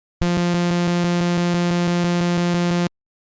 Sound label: arpeggio